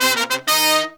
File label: 96BPM, Horns; 90 s Sample CDs; Zero-G; Phantom Horns